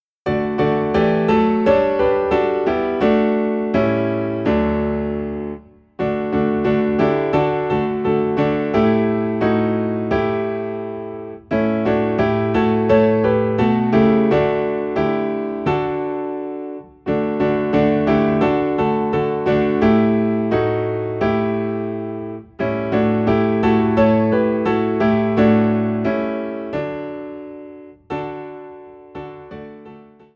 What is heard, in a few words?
Piano in D; Count Your Blessings